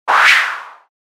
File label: arrow; 武器効果音; モノラル